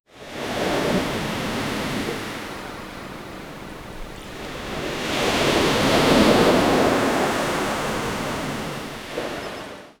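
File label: surf waves crashing on the, beach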